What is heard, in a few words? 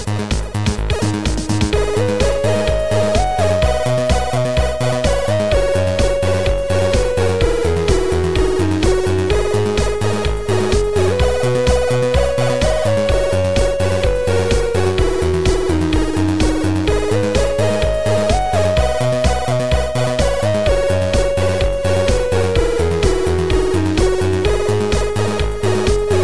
8bit Keygen Music